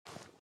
run sand; out of reach treasure royale 2020 gamerip pc

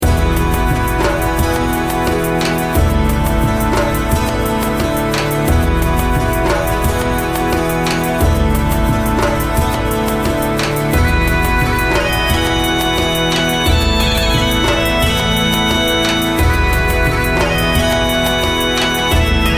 bgm village festival; goken

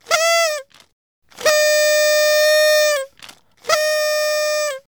Carnival Whistler Trumpet

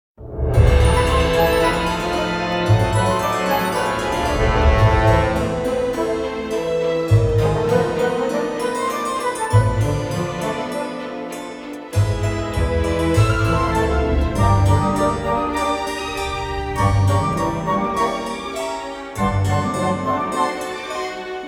Menu Music; Hearthstone

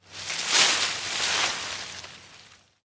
Ambient Bush Move

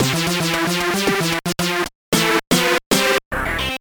Jingle, soundtrack; ATV Quad Power Racing; GBA

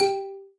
Combo Sound; Candy Crush Soda

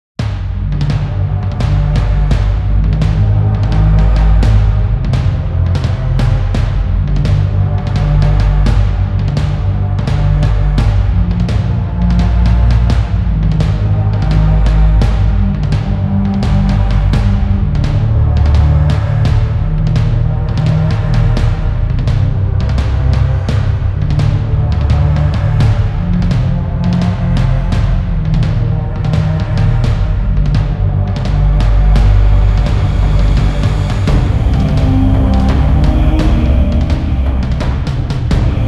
Battle Theme Without Snares; Real Warfare 2 Northern Crusades

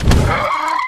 spider soldier open

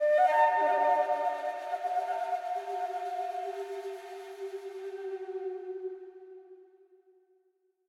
flute

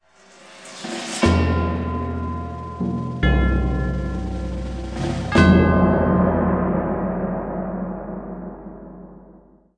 Battle Defeat; Pax Romana

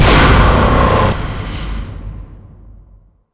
Music

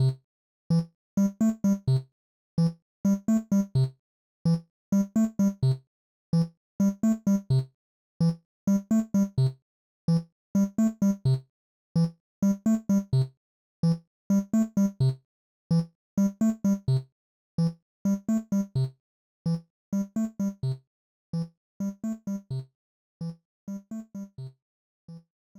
Metronome; Nintendo DSi Metronome - Donkey Metronome (In-Game)

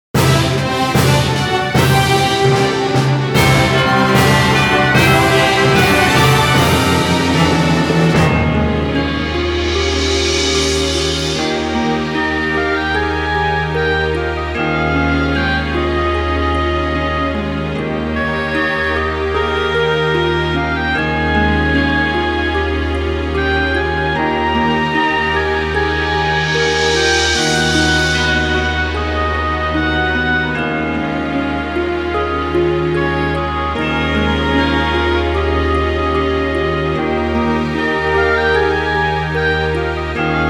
Horns of Victory; The Last Remnant

